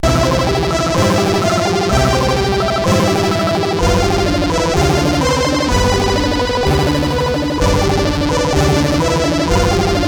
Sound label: Music Samples, sid, CSG8580R5